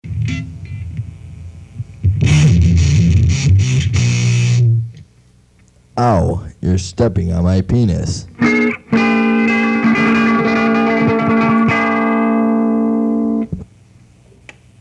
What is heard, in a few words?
owwwww